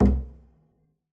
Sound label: pizz noise mute c, double bass